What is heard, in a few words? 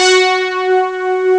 rock'n'roll; Amiga Soundtracker Sample Packs